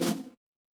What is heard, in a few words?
feedback level up